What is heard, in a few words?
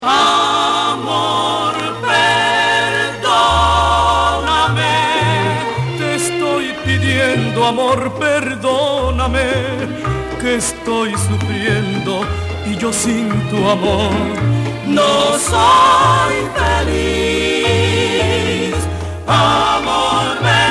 Quartet